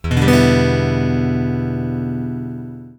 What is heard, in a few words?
Em7